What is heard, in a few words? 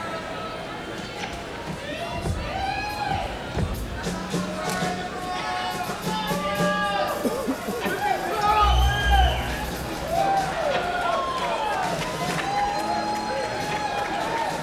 Crowd Tuning; akg se5